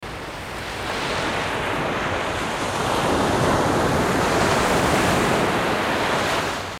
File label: sea waves